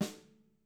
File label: snare, Interactive Drum Kits; Sonic Reality